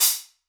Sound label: Sparkle Steam Engine D, Hats; Airwindows